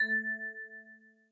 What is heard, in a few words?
Hint